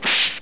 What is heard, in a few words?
kill; djgame2